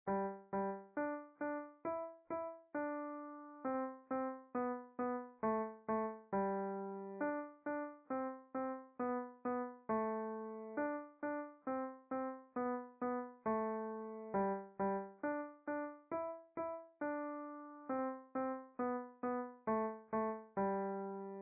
Legato Staccato Twinkle twinkle, beginner piano music